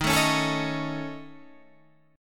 Db Suspended 2nd Sharp 5th Eb, chord

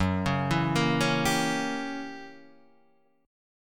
Gb 7th Flat 9th, down, Gb7b9 chord; arpeggiated; JGuitar

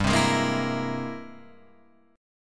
Ab Augmented Major 9th G, guitar

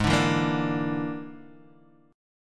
Bb 7th Flat 5th Ab

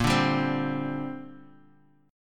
A sharp 6th Add 9th; A#6add9 strummed; JGuitar

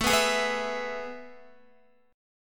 A Major 7th Suspended 2nd, Guitar; strummed; guitar chord

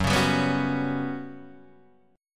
G Major 7th Flat 5th Gb

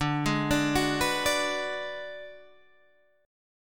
D 6th Flat 5th D down Guitar Standard, chord; D6b5 arpeggiated; JGuitar

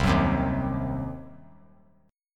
C Diminished C, 3C,3F,3A,3D; strummed; JGuitar

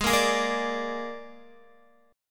Ab Suspended 2nd Flat 5th Ab Guitar; Listen to Absus2b5 strummed; JGuitar

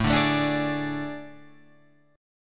A Major 7th Suspended 4th, Guitar, Open F; AM7sus4; strummed; JGuitar

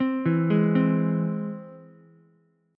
E Augmented, Ukulele; 5,4,4,3; arpeggiated

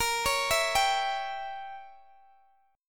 A sharp Diminished 7th, Guitar, Open G; x,x,20,18,17,17; arpeggiated

